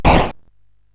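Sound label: Nebulus, MOS 8580 SID chip music, games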